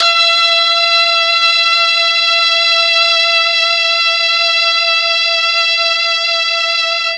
E4 brass, mellotron; MKII BRASS

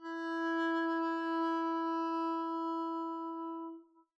arco e4 pp down, double bass

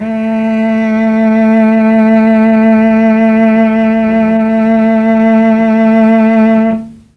A2 300 CELLO, mellotron, STRINGS

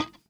a3 release, glowlybass

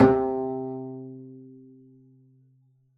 pizz c3, double bass

